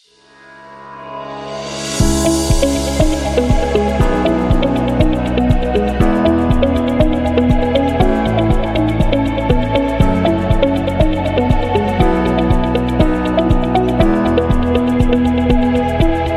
Inspiring Emotional

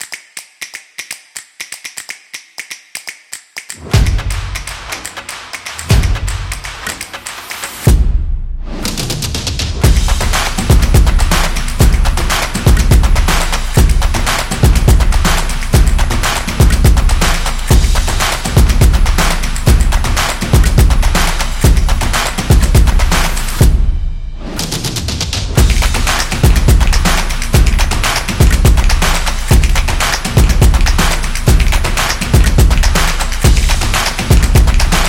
Office Timelapse

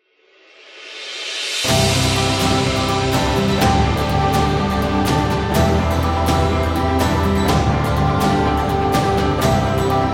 A Motivational

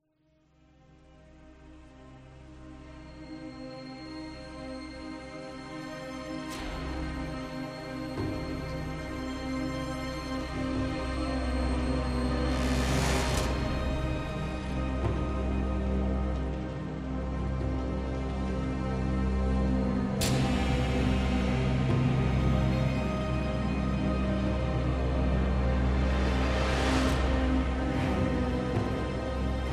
Tense

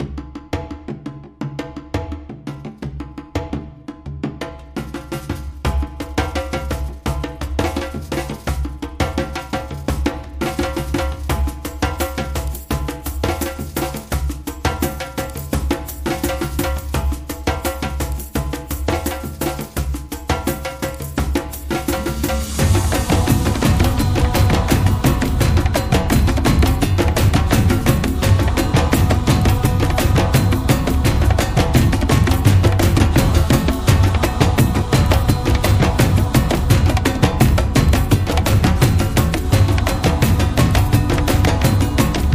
Tribal Ritual

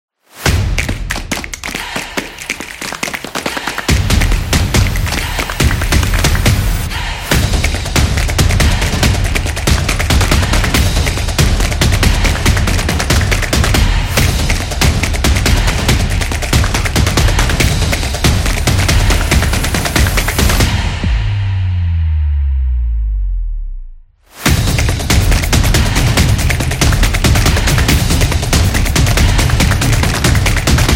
Action Drum

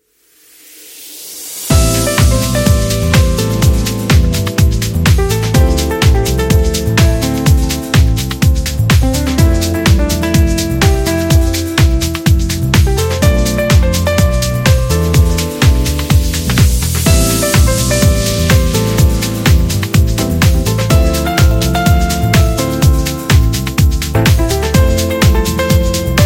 Jazz House

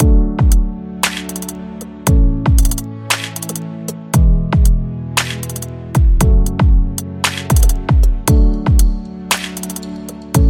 Podcast Background Music

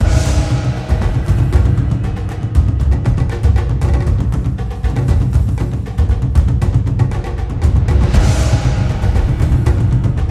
Japanese Percussion

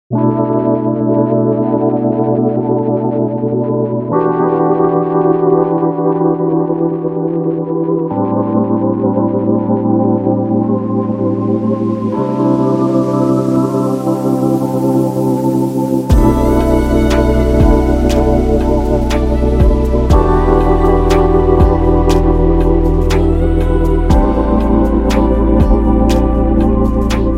Premium Product Promo

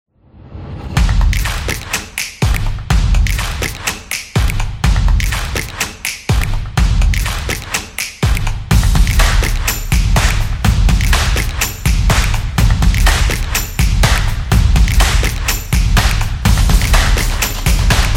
Drum Beat Energy; sports beat

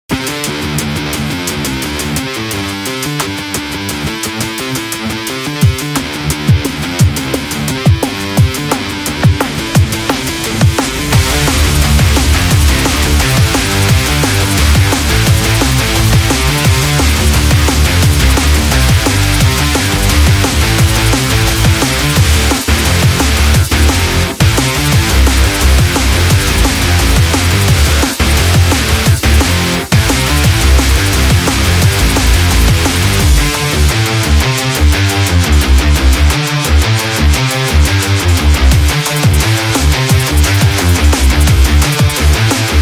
Extreme Sports; sports training energy

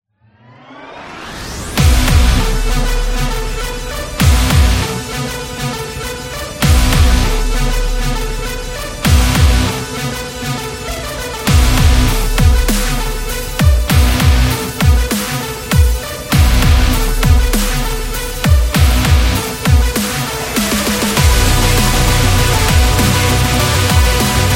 Dubstep Rock Energetic

